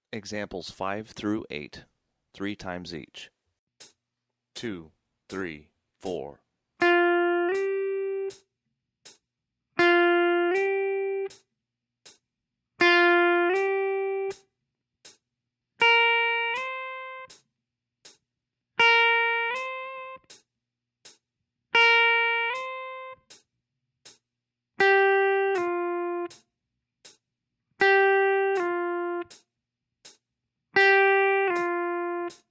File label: slurs, blues guitar